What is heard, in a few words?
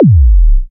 pre big win; twin spin mw